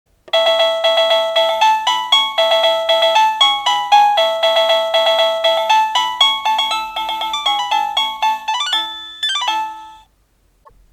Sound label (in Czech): melodie